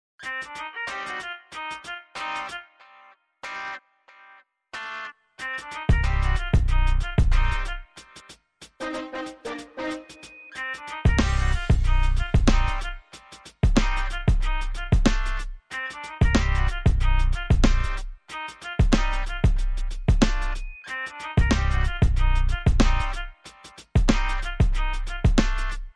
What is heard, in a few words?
Hard Urban